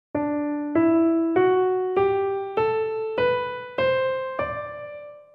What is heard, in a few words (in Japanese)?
Dmix スケール